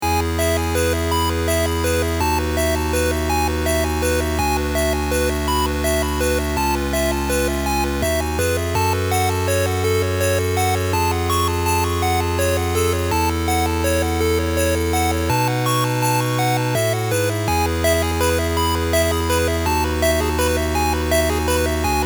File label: bgm grass; Syupro-DX; Android